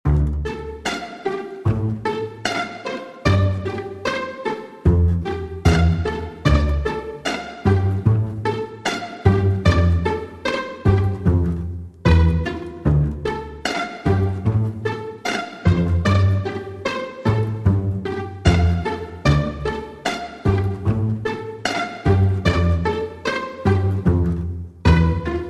Brass and Strings Only

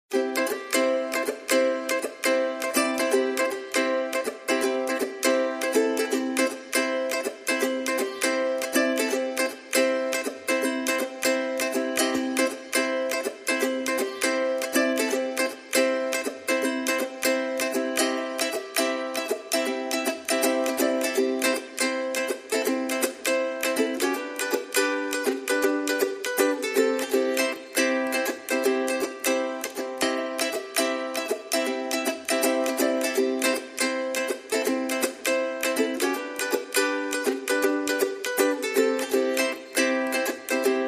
Warm Ukulele

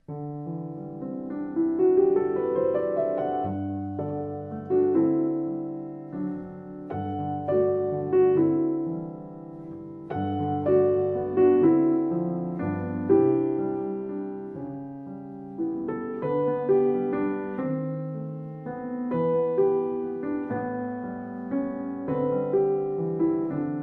Solo Piano

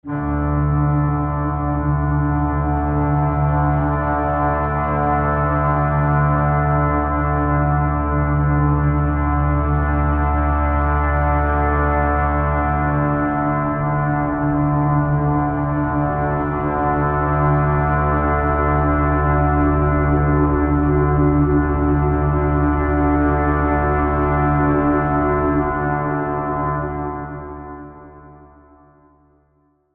Placid Lake Brooding Drone

